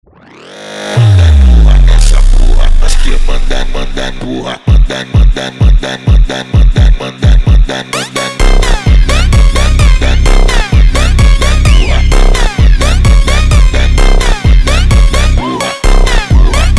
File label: phonk